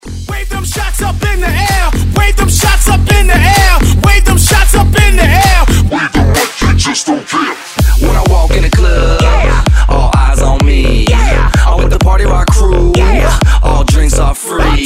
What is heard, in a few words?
Hybrid Bounce Bootleg 128